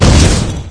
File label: sound explode; replicaisland; android 6.0.1